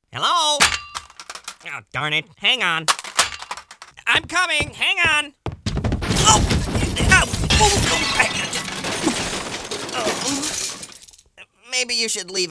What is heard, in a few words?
Phone drop